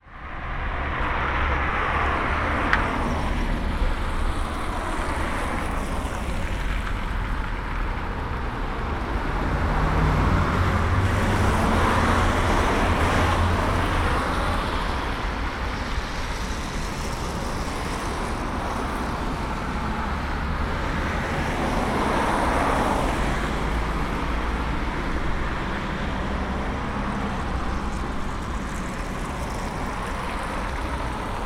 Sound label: autorepair car wash; skobyanoye shosse; sergiyev posad